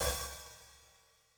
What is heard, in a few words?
HIHAT OPEN; JUNGLE BEATS; ELECTRO KIT